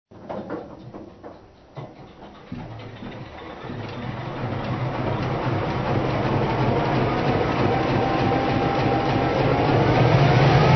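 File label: EMD GP-7, locomotive; SP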